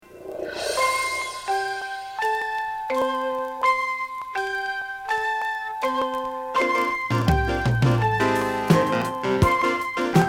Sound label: Funky Brass